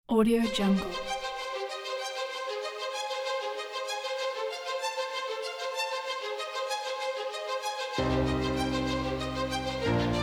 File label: epic cinematic trailer